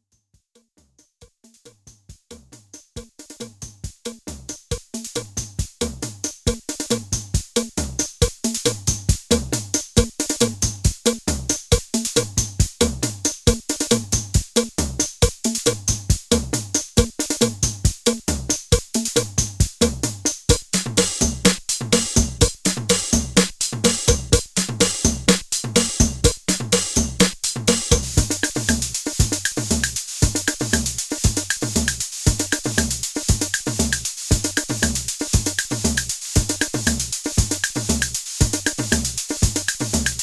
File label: Wersi CX2 dry Calypso